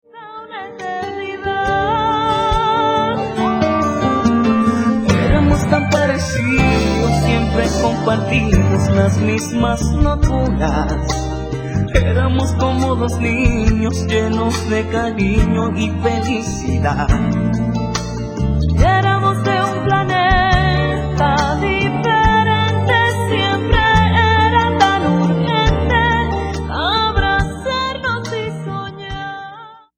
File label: ballad